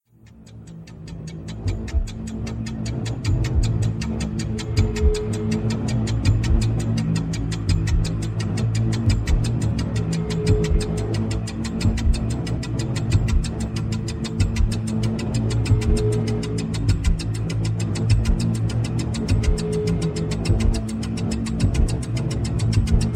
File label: collapse tension, ui